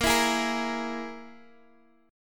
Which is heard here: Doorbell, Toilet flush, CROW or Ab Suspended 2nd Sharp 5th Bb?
Ab Suspended 2nd Sharp 5th Bb